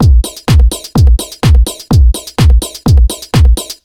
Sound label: 126BEAT, Disco House; USB Soundscan vol.20; Fresh Disco House I; AKAI